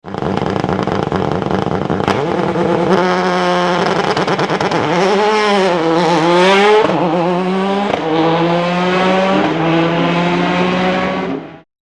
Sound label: Ford Focus WRC, start; monza 2007